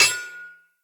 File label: Ambient Blacksmith Hammer Impact; Midrath